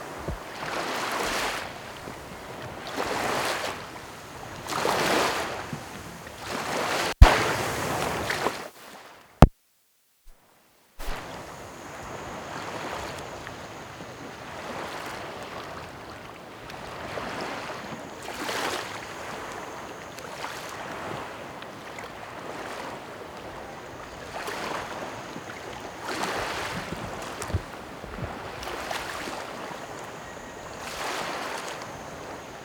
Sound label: gentle waves